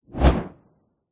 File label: hit whoosh fast swoop